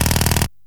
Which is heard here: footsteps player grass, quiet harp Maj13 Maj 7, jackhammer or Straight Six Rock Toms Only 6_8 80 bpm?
jackhammer